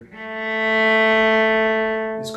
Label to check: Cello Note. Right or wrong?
Right